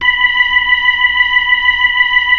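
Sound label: B-3, Hammond B-3 organ; Roland LCDP10 Keys of the 60s and 70s 2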